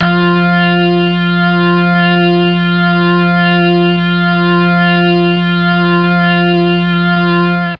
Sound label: F2 organ, mellotron MKII organ